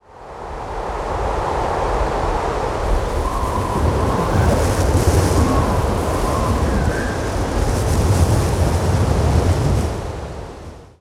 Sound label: Mall Staff Side Wind Soundscape; KatFL; Alivel Mall Staff Side